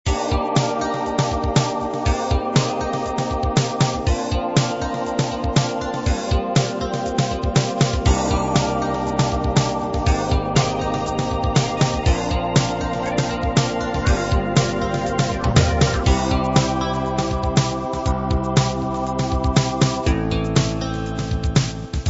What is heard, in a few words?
fatback groove combined